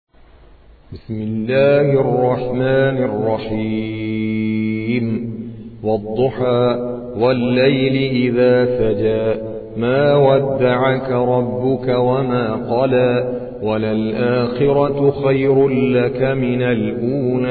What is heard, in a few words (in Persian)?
ترتیل قاری هندی; سوره ضحی